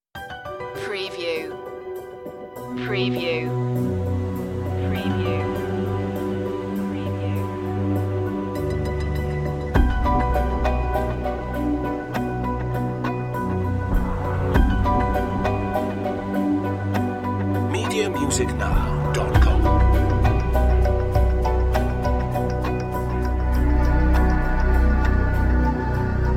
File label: underworld series dramatic cue